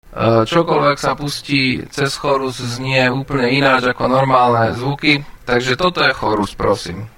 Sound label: chorus; Mirex